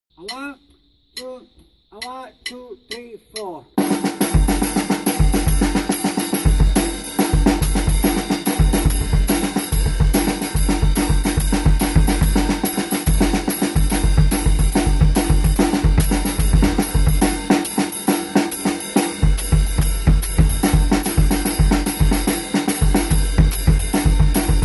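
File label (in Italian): jazz drum method; metodo per batteria jazz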